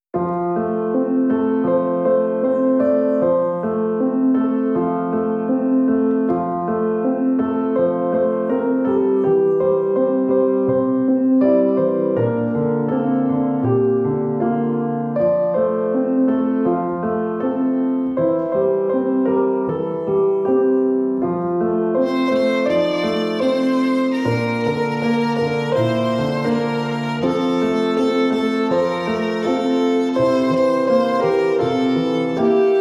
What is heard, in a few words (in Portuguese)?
Violino e Piano Instrumental